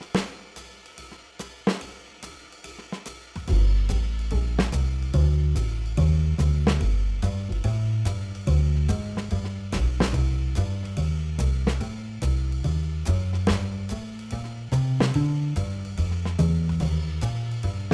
Jazz Improvisation Backing Track NO PIANO for compers